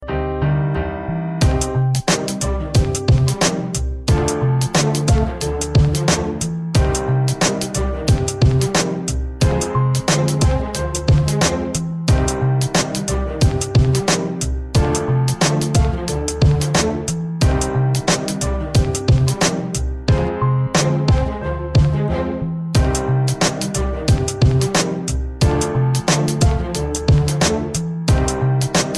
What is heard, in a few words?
Lovers Beat